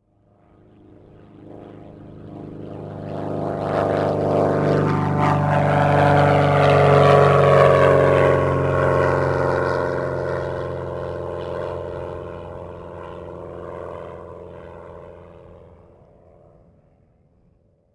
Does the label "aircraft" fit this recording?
Yes